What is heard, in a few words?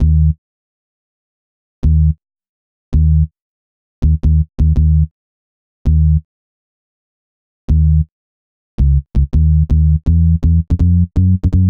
Synth Bass; Basses